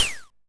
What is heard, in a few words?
Ym Multi Tom G4; multi-samples; CHIPSHOP DELUXE